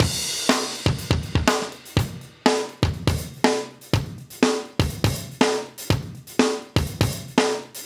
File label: Live Breaks, 122